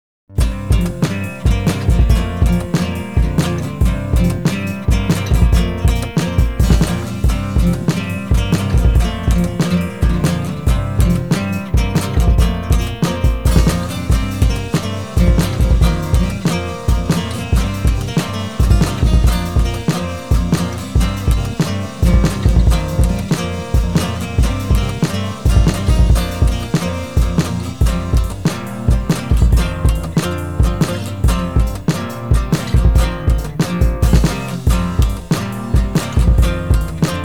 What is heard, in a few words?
Bass